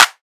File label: Clap; Dubstep; Cymatics; Dubstep Starter Pack; Drums - One Shots